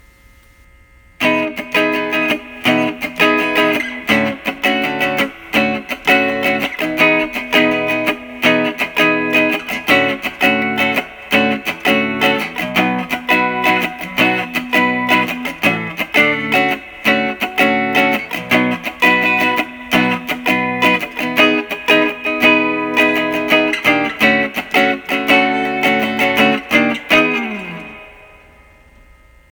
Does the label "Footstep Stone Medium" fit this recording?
No